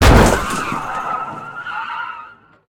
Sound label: hit car; Blind Drive